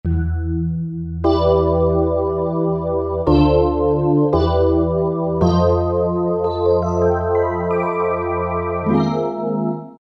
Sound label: Korg T3 Wavesweep